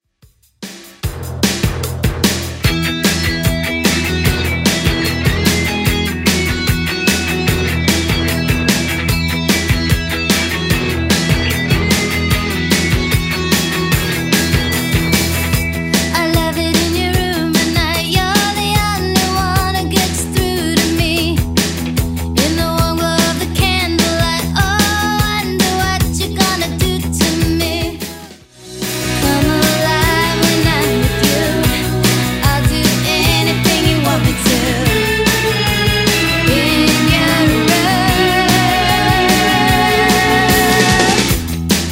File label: 80s, 149 bpm; Redrum